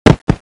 zombiedog run

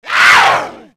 kid zombie attack; nmrih; childrenofthedead; zerosiki; slender

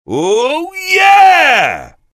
soldier laugh happy

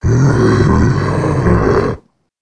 alert, male zombie; nmrih; zerosiki; slender

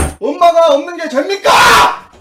scout negative vocalization

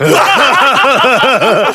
heavy laughter big

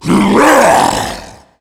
tank attack, voice

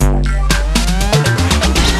failure; tf2ware ultimate; music game; wii 18volt

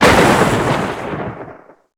storm attack; chiabihamaru; cv project; invading executioner; zerosiki; slender

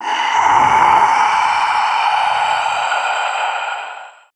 female zombie idle; nmrih; zerosiki; slender

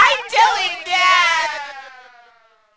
stun; roblox; coolkidd; slender

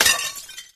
ceramic destroyed; zombie riot; btd

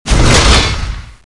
skill hit; deimos

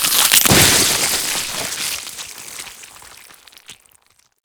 spider despawn; granny; zerosiki; slender

